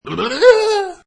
Cartoony Male, Male cartoony falling reaction varation 2, Fluttering lips; Cartoon Voices